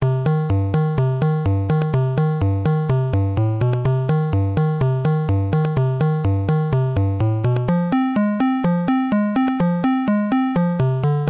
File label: alien blues